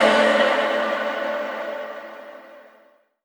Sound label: "night", Voice